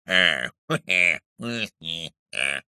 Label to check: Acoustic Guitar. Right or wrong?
Wrong